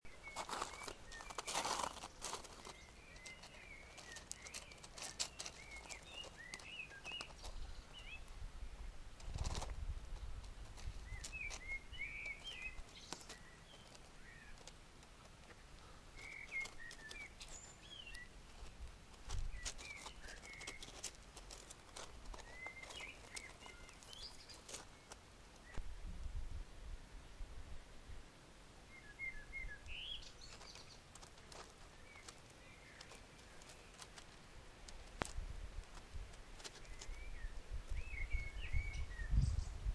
cat walking on gravel blackbirds singing

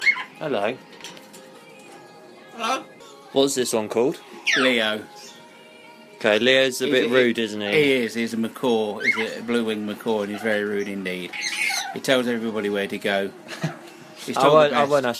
swearing parrot; leo the swearing parrot

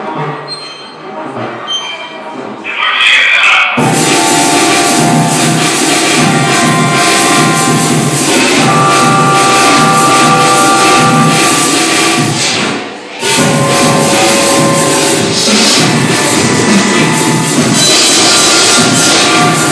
complex noise

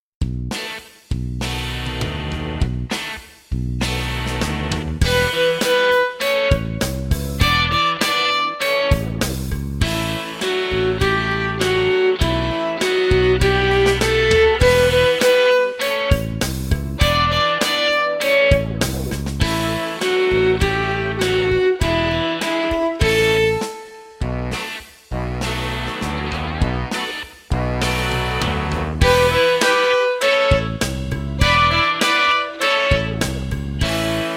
violin

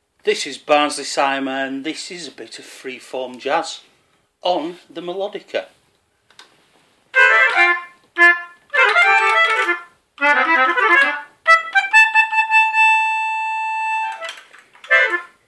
free form jazz melodica style

